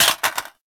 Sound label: Reload Drop Mag Default, Weapons; Warframe; Boar